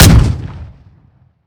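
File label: dpapa12 fire player, weapons; gmod; iw8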